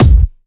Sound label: Kick, 128BPM; Vengeance Samples; Radiate Kit